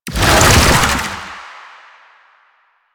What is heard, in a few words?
Infested Oni Melee End; Warframe